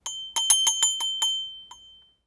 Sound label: doorbell hospital; anemoi